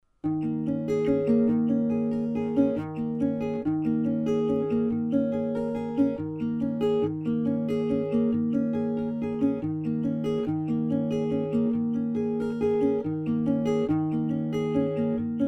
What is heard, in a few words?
Acc Guitar KM84